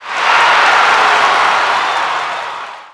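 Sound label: Crowd Roar; tsdemo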